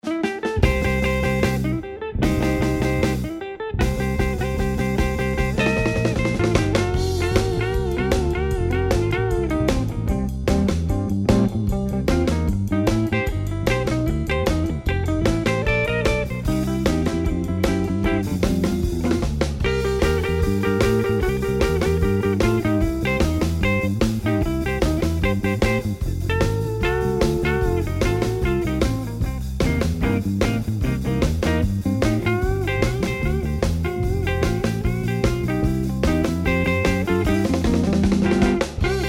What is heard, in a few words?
Rock Roll Blues Drumming